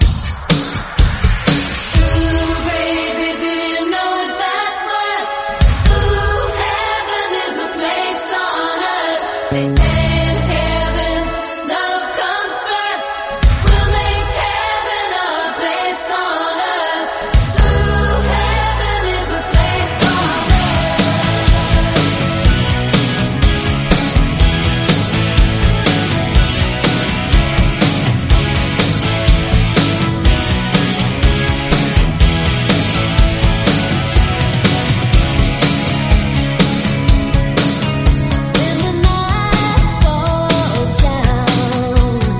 123bpm Fm; REDRUM

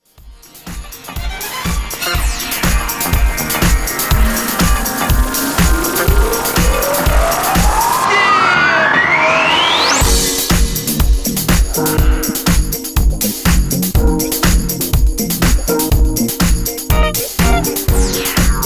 Classic Mix 122 Bpm

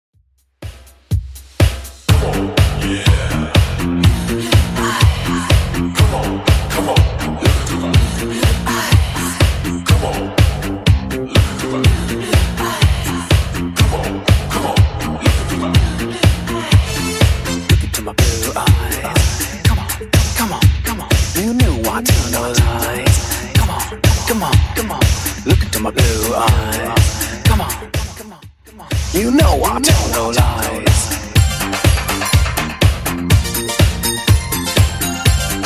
80s Redrum 123bpm

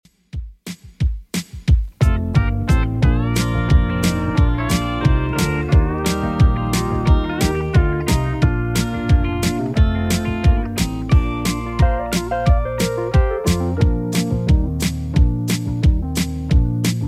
70s Redrum 178bpm

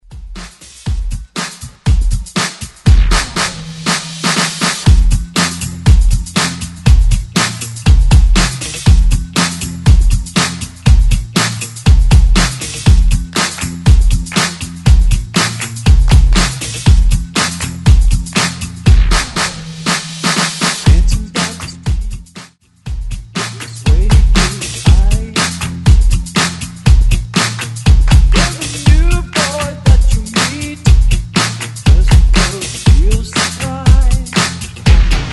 70s redrums

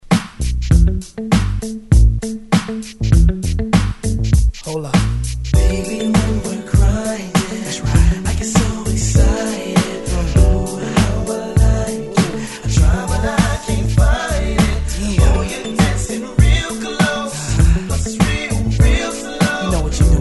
90s Intro 99Bpm

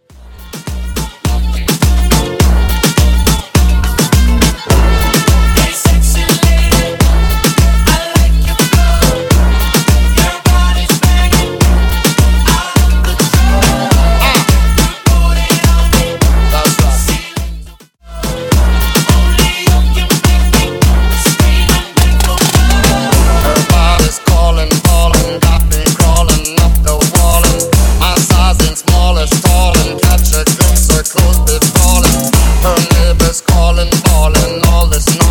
Reggaeton Beatshow Edit 106bpm

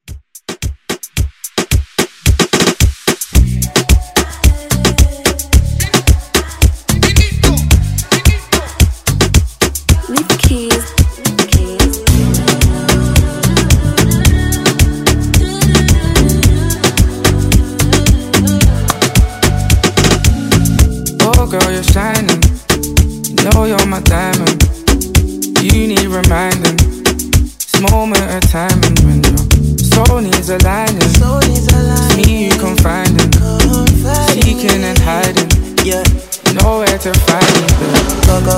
Afro Rton; Redrum

